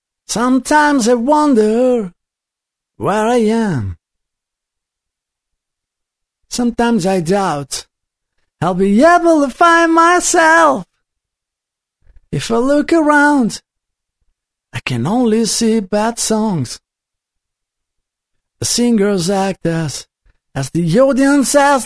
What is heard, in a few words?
Vox Vintage